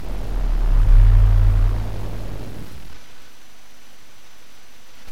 glass shatter short

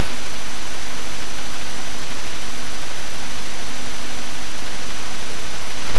keyboard slow

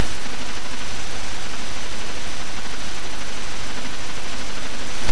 door knock gentle

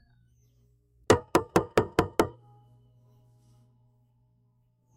door knock gentle

